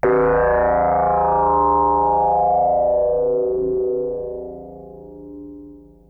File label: Synth hits and riffs